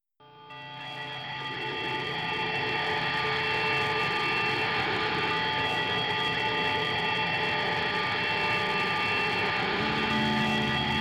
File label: fearful ambience; unfamiliaritybrain